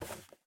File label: Box Take; Game rip; MiSide